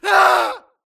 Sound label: grunt death crush; Titanfall 2